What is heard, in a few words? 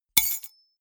key ring large drop stone hard